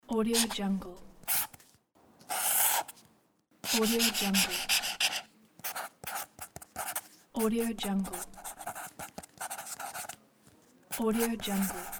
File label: writing drawing with marker pen; luishpms